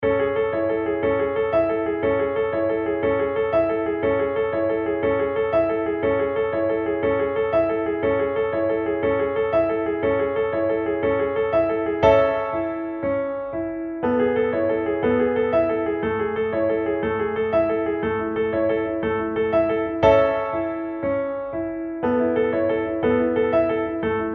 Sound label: just like the sea piano accompaniment